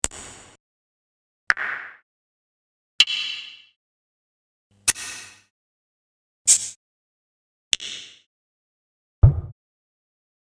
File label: Yamaha Tenori-On